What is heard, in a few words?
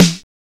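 snare drum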